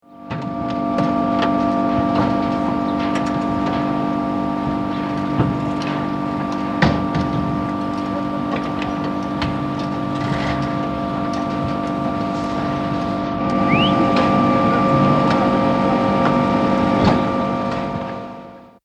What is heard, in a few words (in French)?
chantier sifflement creneau hauteur; Culture sonore